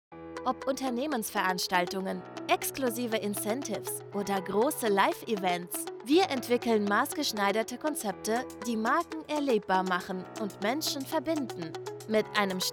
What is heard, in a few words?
Corporate Music